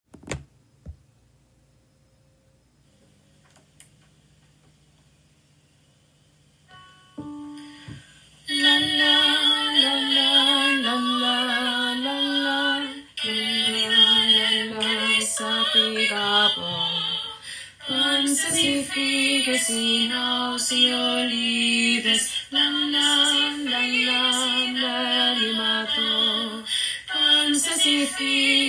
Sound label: tenor